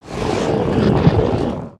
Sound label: Thwok yell; Summoner